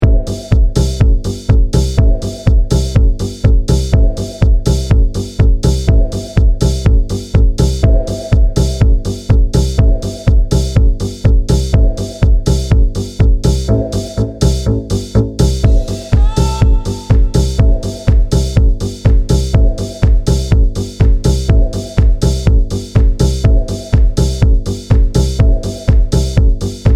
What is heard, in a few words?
ringtone